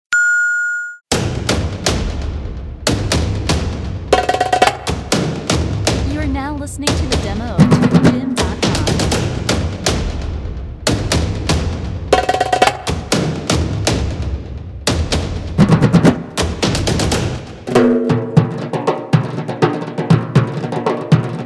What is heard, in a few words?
Drums